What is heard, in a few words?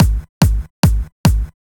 144 BPM Sub Kick; Vengeance - Essential Clubsounds Vol.5; Flight Kit Root Amin; Drums & Perc